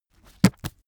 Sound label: heavy book shut